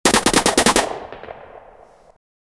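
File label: rapid ak47 gunfire realistic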